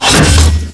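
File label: grenade launcher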